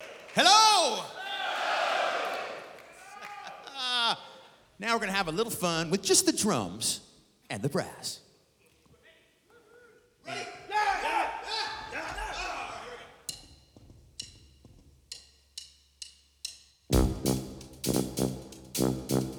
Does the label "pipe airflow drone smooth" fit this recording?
No